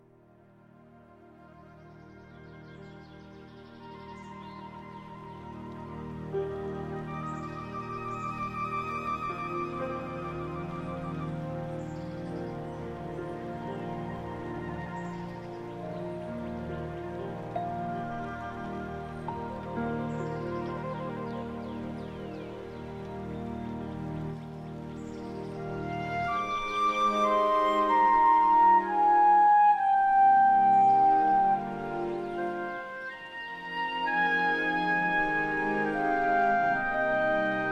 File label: trills; ponyTown